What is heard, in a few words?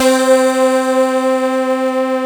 BREATHY C3